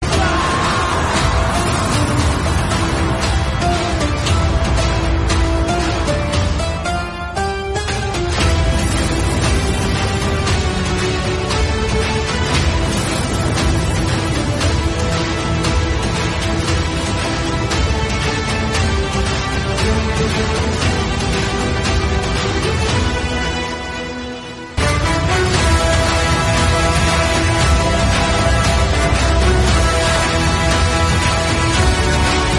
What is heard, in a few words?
car fight bgm; kgf 2